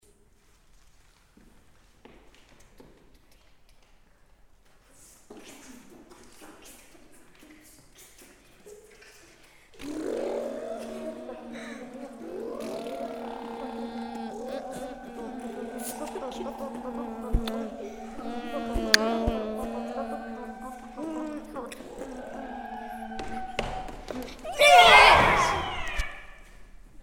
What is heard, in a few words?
Beatz